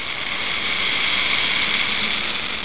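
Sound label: rain stick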